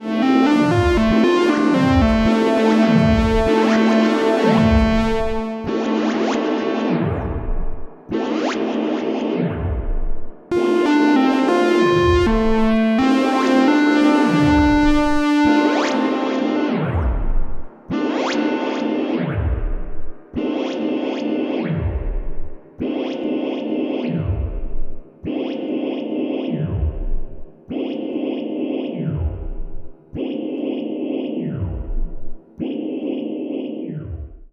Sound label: Strymon Magneto Tape Control w Step Sequencer